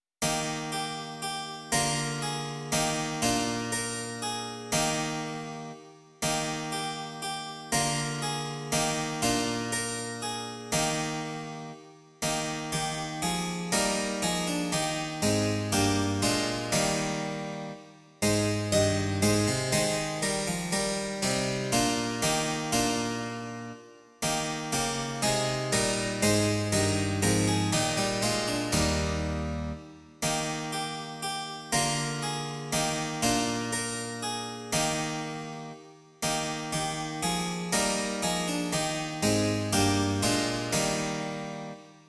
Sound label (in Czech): cembalo; Již tedy s Bohem jdi; Imaginativní verze